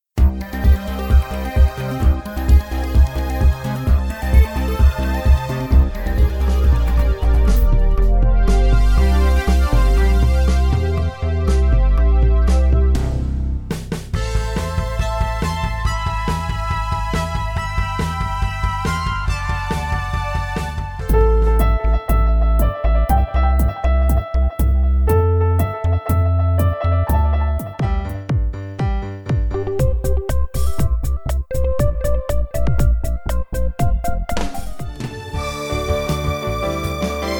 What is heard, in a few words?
MEDLEY Jingles